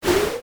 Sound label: Panel Out; AOL